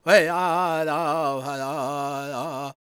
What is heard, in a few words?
C# MAJ, World Voices, LAKOTA; Spectrasonics Vocal Planet CD5